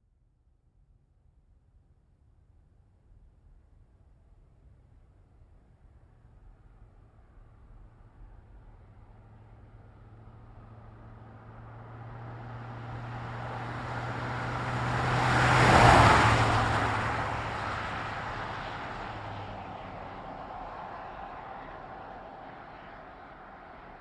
Truck GMC Savana By Fast; Trucks; Vehicles; 2010 GMC Savana

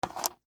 Game Dice Up, Pick, From, Board; Sports, Board, Game, Dice, Pick, Up, From, Game, Board; Board Game Sound Effects

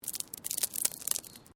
Stone Small Move; Material components for constructing more complex sounds; Rocks; Sound Effects:Materials

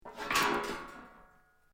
METAL STRAND MOVE; Metal (Sound Effects:Materials)

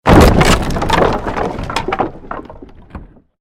created wooden structure crash medium

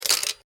Spring Hook Foley Rockclimbing Clip Slide; Latch; Latches

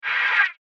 Laser Glider Skid, science fiction sound effect; Laser Sound Sound Effects

